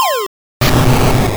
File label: Barrage; Pokemon; Gen 2; attack moves; GSC